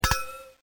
CRYSTAL

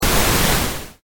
pink noise

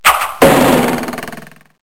arrow

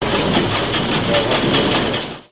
Teletype Noise

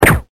gunshot silencer

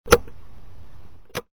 click

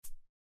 toolbar Button Press

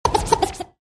little scratch

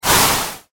WIND

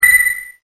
ping

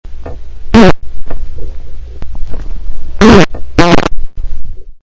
fart

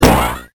plasma

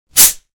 soda fizz

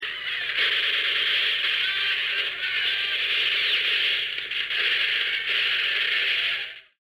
battle

